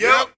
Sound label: Chant